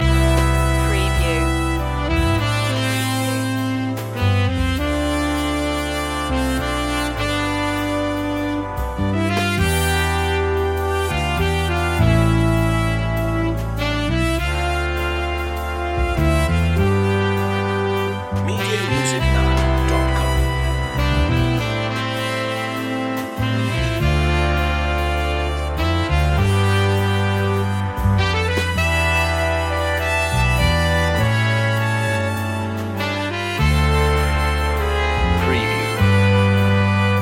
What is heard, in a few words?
big band romance loop